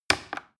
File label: plastic button little click heavy slow